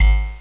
egg launch

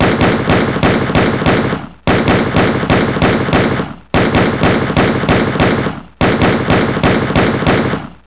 24 shots

